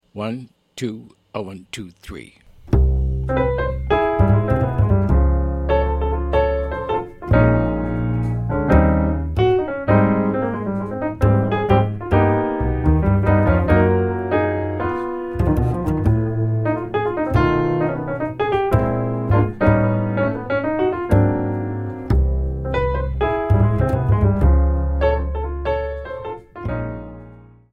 minus drums

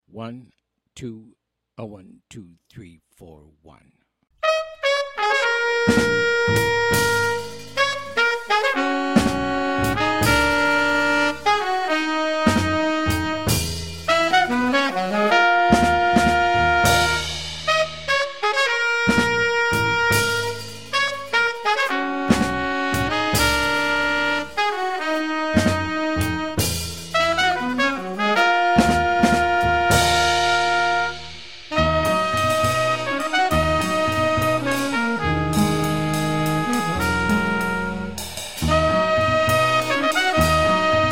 minus piano

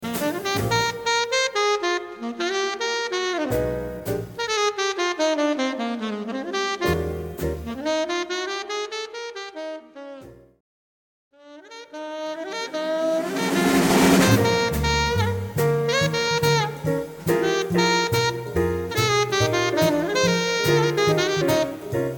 solo

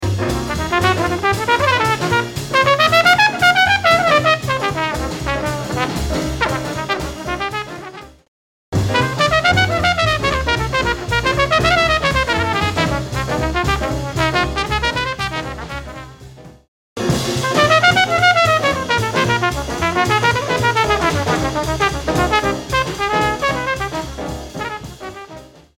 solo

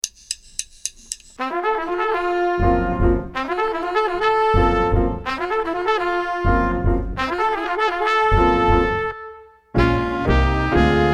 minus Drums

